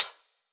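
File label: classic hip hop hi-hat; STYLE KITS; Classic Hip Hop Mega Kit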